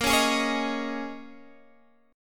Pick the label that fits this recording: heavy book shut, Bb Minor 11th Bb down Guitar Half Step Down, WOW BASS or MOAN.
Bb Minor 11th Bb down Guitar Half Step Down